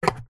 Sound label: household blender lid close